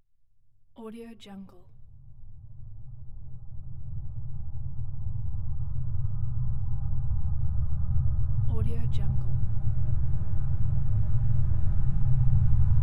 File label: drone ambience